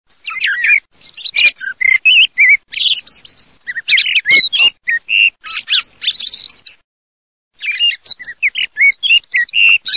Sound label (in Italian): tordo merlo